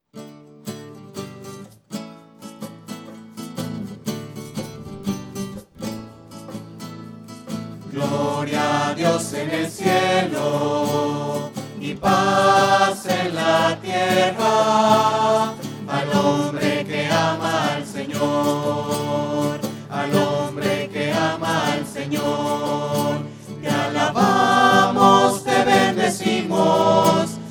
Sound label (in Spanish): voces; misa